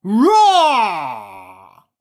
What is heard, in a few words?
dino roar; SyrinScape; Syrinscape unplugged; Jungleplanet dinolaser battle